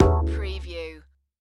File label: Multimedia Percussion